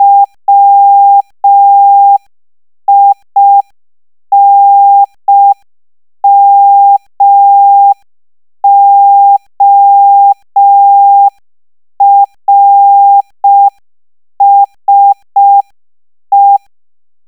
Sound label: 5 wpm standard, morse; winmorse